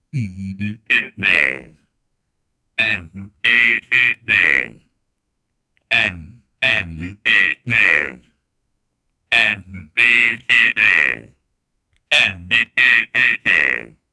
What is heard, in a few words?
industry machine but with; machines